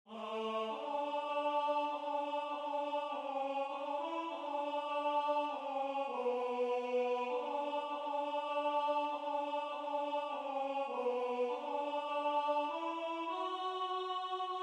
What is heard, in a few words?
Alto